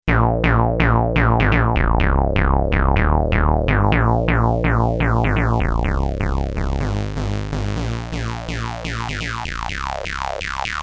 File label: Notch Sweep